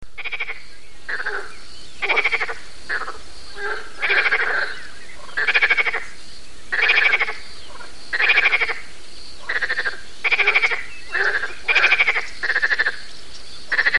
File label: Rana bedriaga